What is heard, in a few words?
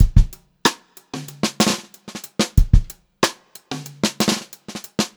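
groovy variation, Funk-Groove Drumloops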